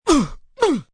Grunt Impact, Grunt, groan, impact, male, hit in stomach x 2, pain, hurt; (human voice); Groaning; Voice Box